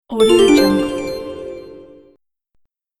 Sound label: game level marker notification